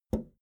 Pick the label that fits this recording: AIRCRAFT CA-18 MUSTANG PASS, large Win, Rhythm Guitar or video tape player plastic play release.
video tape player plastic play release